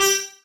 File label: confirm; Geschicklichkeit; Bricks Of Egypt